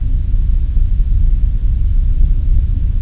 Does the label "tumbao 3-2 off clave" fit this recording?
No